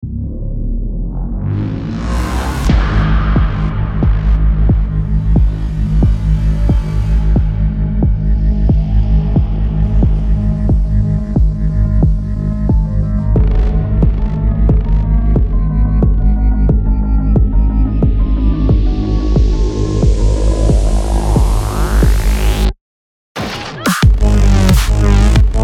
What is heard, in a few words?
Electro Cyberpunk